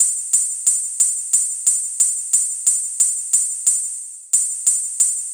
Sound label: NRG Cymbal; Drums; Cymbals; Trance; Sonic Foundry (Sony Creative Software); Trance NRG VOL-1